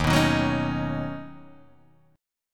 D sharp Minor 7th Sharp 5th, Guitar, Half Step Down